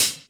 natural closed hi hat sound e key

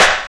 smooth acoustic snare sound g sharp key; snare drum samples

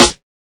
wet acoustic snare f key; snare-drum-samples

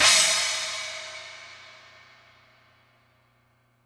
large room open hi hat sample D# key

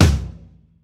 big room snare d key

